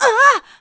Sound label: Link Meet; Link's shocked gasp when meeting Ganondorf